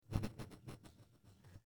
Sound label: phone no vocals